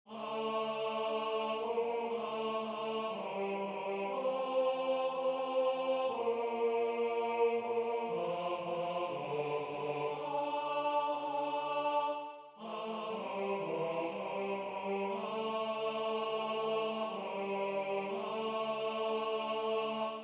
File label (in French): tenor